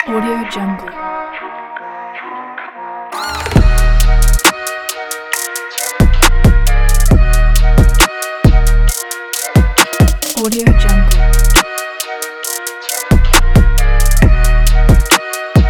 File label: new school hip hop